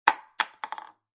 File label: Foley, Aerosol Can Lid Drop On Table; Drops; Objects